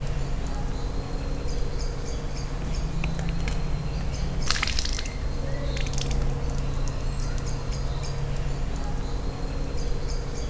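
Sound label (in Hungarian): szükség, strucc; szegedi zoo 2011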